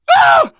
hurt critical, survivor voice

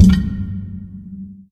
lightbridge, footsteps

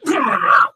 ballbot pain hard surface

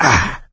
hurt minor; voice; source engine; left 4 dead 2; survivor

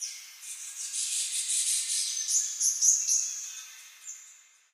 amb african birds; video games; ambient; nature; source engine; portal2

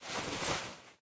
workout pushup; taunt; player; video games; source engine; tf

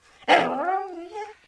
dog med inside growl, animal; ambient; Source Engine; cstrike

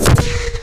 strider minigun; Source Engine; video game; npc

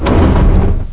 talkstop, plats; Source Engine; bshift

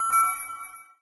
amb random tech; source engine; portal2

